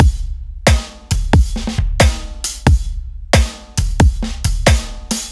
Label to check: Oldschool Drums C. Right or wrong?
Right